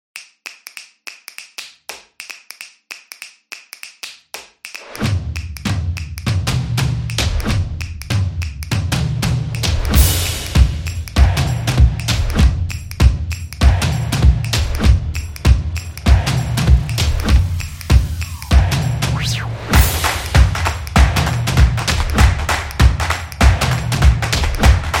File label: Drum Intro